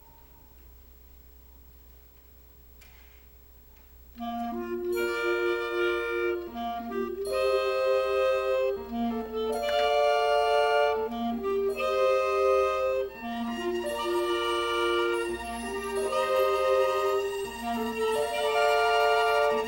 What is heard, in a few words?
flute solo, orchesta concert